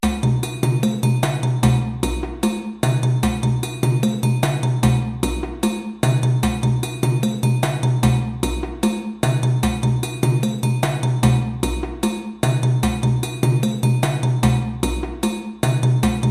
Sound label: Diabana Groove 150 bpm, djembe